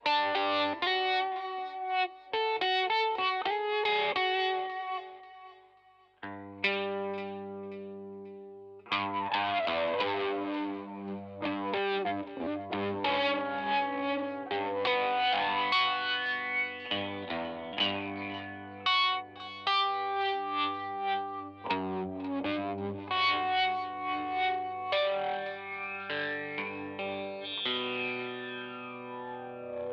delay autowah

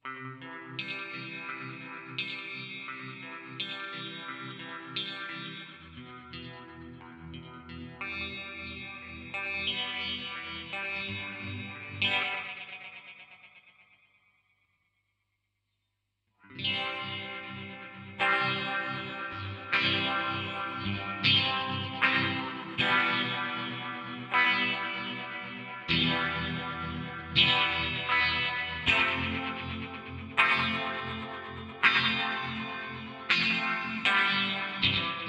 phase echo down

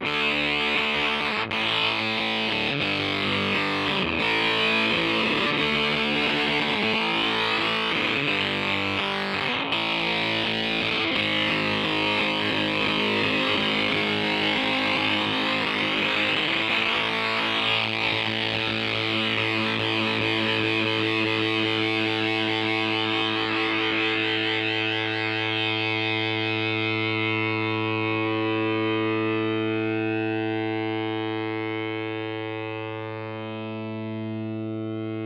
chiptune64

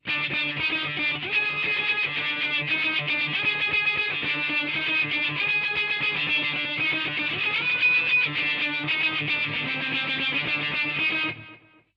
sonic crunch fx

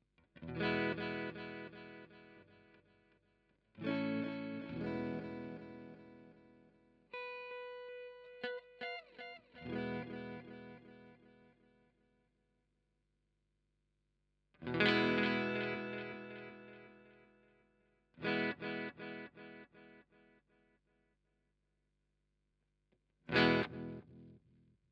delay

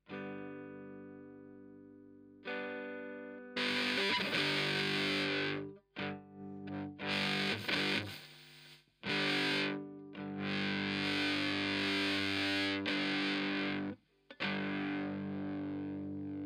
distortion tone stage

